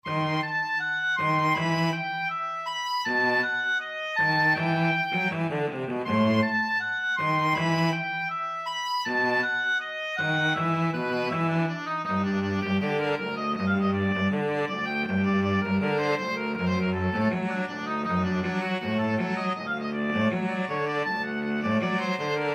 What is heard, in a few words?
oboe cello duet